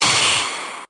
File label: Parry; TheChaseBuzzer